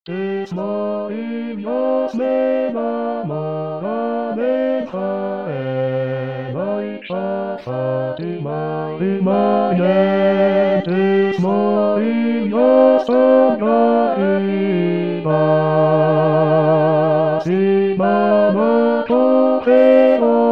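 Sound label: bass; Tres Morillas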